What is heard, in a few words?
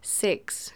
Female vocals and robo-vox